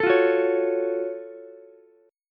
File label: F sharp Minor Major 7th F sharp down Ukulele; strummed